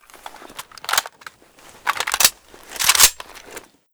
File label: groza reload empty, weapons